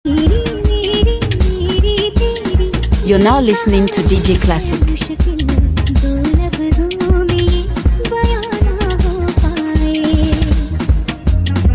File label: Beatz